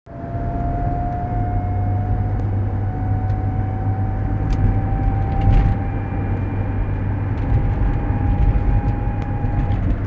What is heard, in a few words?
rx350 whining noise only in cold weather; rx 2nd gen 2004-2009